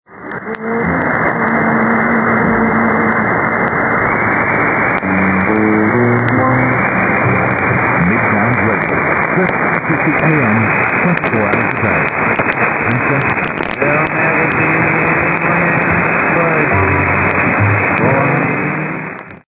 Mid Downs Radio, 1350; sdr Amberg

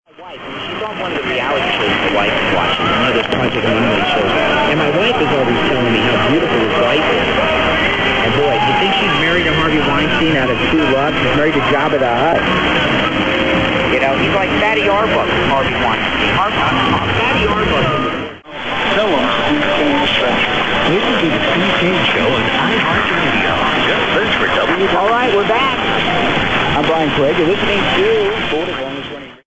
1470 WWNN FL USA; sdr Amberg

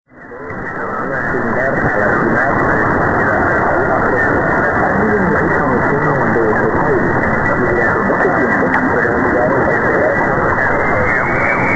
960 R Reloj CUB; sdr Amberg

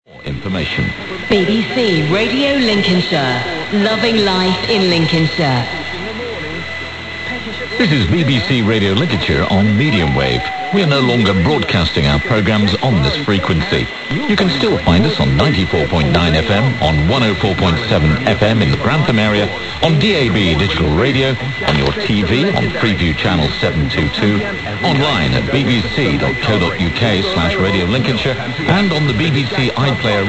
1368 BBC R Lincolnshire; sdr Amberg

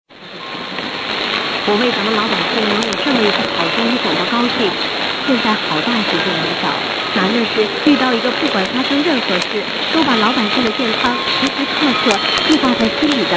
1386 NHK2 JOJB Kanazawa J; sdr Amberg

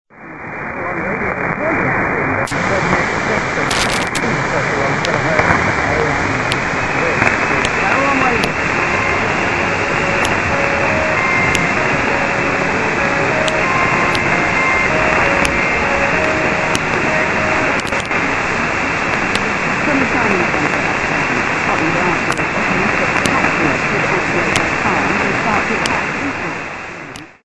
Carillon; sdr Amberg

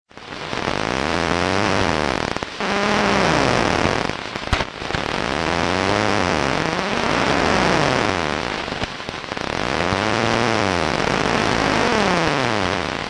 Statics intensity 2

